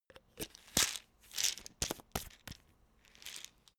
pillbox close

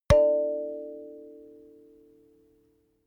notification kalimba body hit

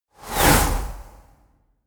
swoosh

